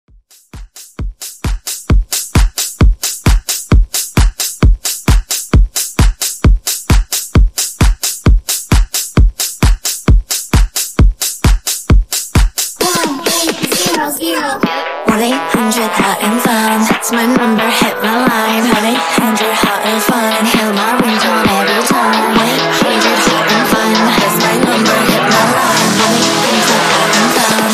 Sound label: 132 BPM